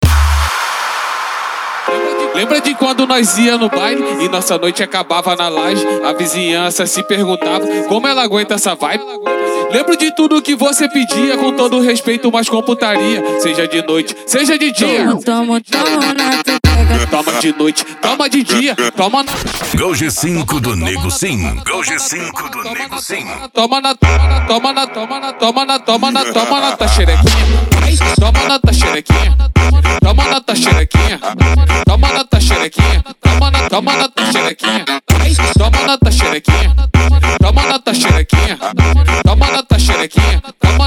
funk mega bass e noia dance

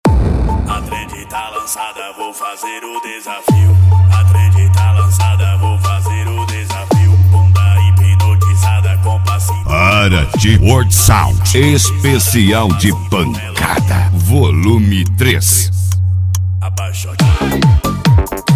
esp pancada

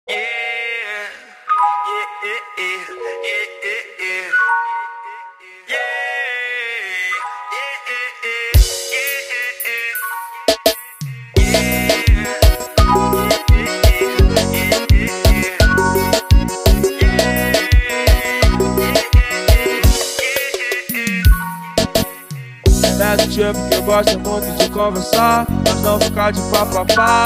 som automotivo especial reggae e bregadeira

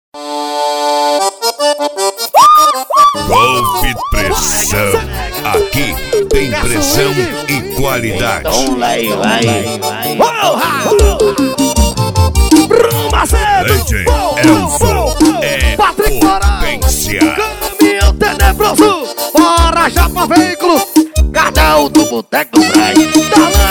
forró sampleado; cd golf pressao sorocaba vol 02

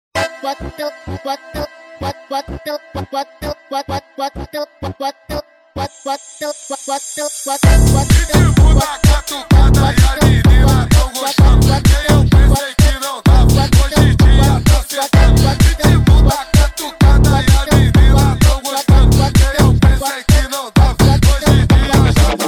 mega funk

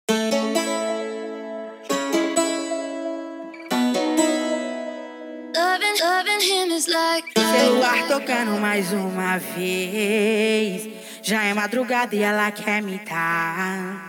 esp de cavalgada

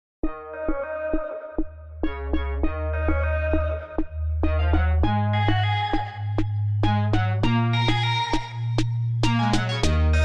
graves bass

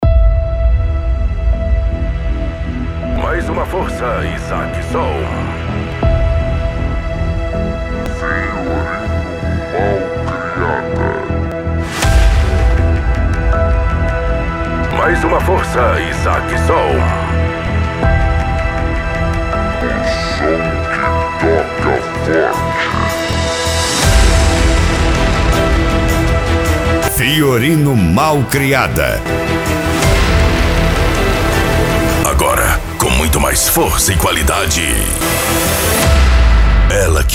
esp mega funk bass

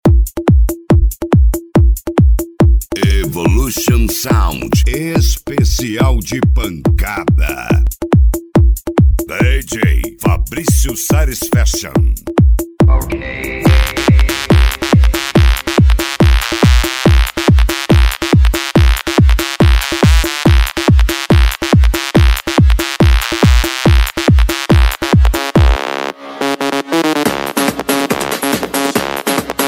esp de pancada

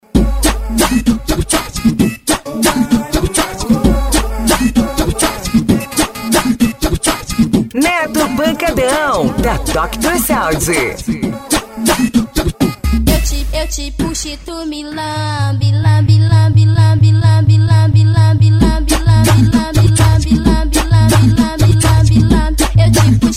funk bass remember

